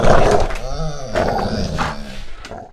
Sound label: beast pain; morass beta; morassbeast